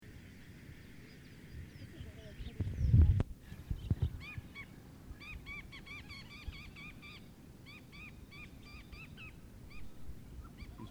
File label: Bird Sounds